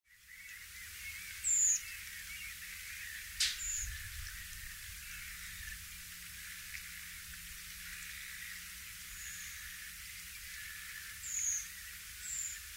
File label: redwing nocmig